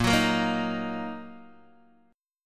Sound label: Bb Suspended 4th Sharp 5th Bb down Guitar DADGAD